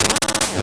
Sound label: 8bit se